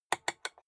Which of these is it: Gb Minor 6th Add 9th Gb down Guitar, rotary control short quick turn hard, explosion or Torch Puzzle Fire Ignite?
rotary control short quick turn hard